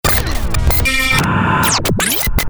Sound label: Chicken Vox; Best Of N2O Library; Stabs & Bursts